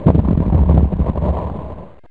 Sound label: Dull Explosion Distant; BOMBER III™ (DEMO)